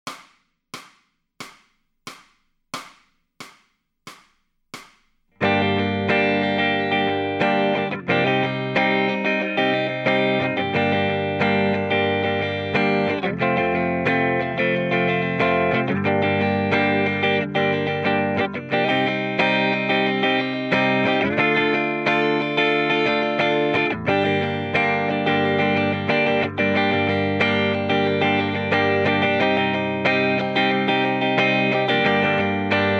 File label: Lead Backing Track, electric guitar; Grade 4